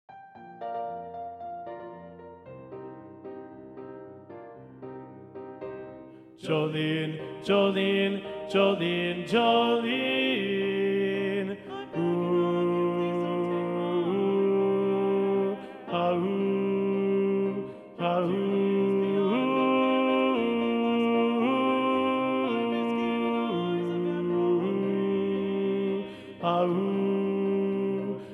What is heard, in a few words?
Tenor; Practice Room